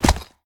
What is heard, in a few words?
pet footstep; smite; lancelot